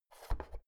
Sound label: oven knob press out